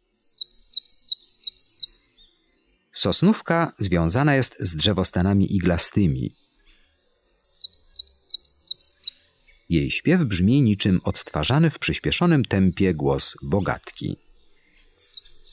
SOSNÓWKA, Ptaki; Kurs PTTK

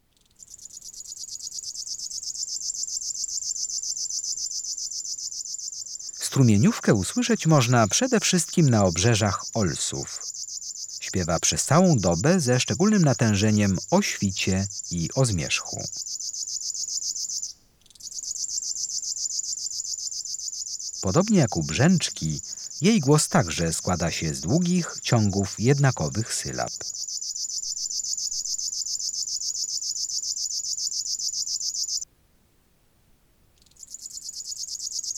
Strumieniowka, Ptaki; Kurs PTTK

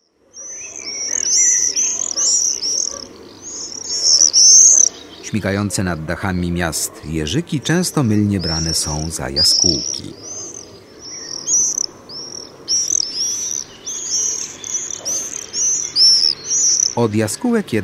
Jerzyk, Ptaki; Kurs PTTK

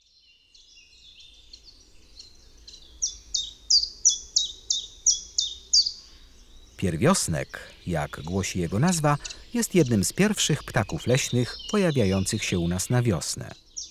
Pierwiosnek, Ptaki; Kurs PTTK

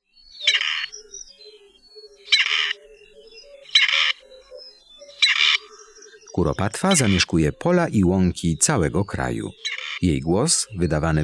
KUROPATWA, Ptaki; Kurs PTTK

